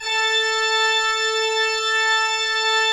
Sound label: C5, cathedral organ; Propeller Island